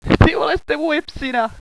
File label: cough, hostage; cstrike